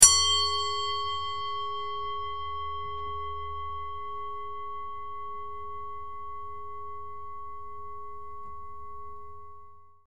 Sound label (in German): holz